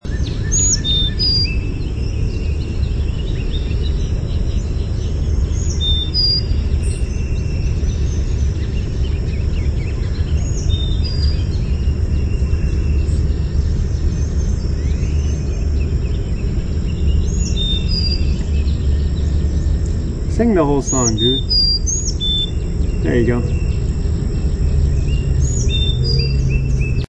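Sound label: Carolina Chickadee song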